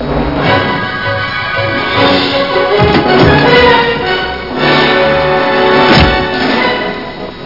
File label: anthem; Amiga; worms dc; arnie